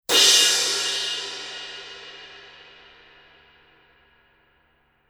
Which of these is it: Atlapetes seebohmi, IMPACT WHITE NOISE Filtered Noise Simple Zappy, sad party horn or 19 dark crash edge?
19 dark crash edge